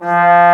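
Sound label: Trombone mf F2; Digital Sound Factory Virtuoso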